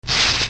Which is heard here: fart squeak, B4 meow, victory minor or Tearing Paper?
Tearing Paper